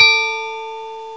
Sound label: BELL, BELLS; CMI Sound Library Rev 1.3